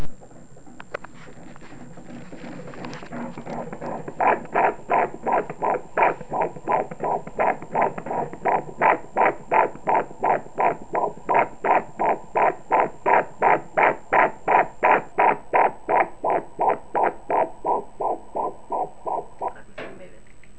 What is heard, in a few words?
heartbeat